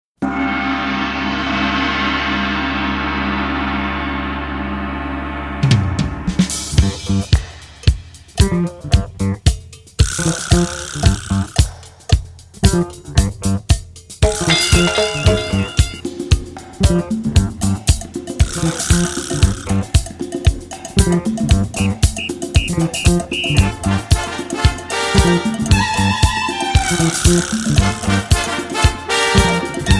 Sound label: korean soul